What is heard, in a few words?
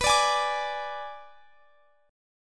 G sharp Diminished B